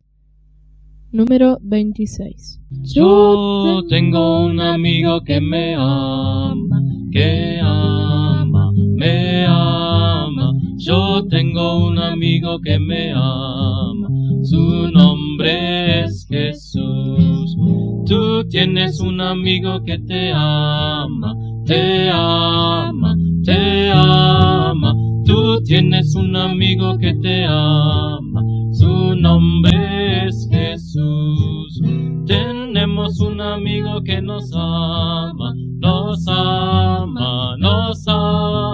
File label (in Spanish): Coro, himnario niños